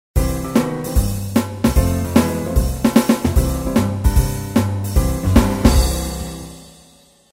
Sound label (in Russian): melodiya konca